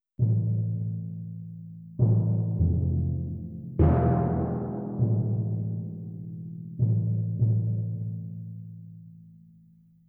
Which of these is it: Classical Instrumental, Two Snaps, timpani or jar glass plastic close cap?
timpani